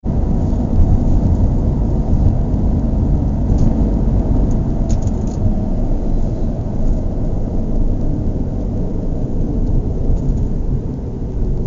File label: car engine idling accelerating